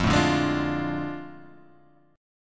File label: A Major 7th Suspended 4th Sharp 5th F